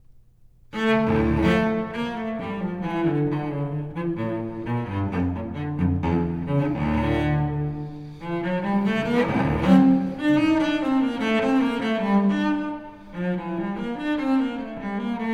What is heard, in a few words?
BWV1008 Suite No.2 in D minor Allemande